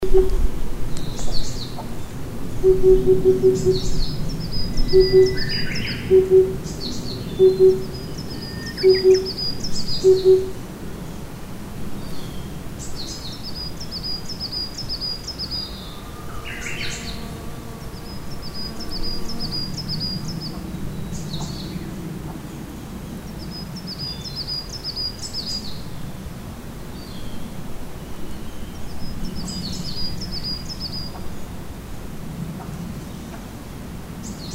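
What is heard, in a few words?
tsutsudori, Oriental cuckoo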